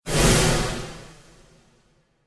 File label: Modulation Whoosh; Whoosh Sound Effects